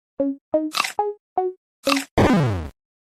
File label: Snake Sound Ringtone; Google Snake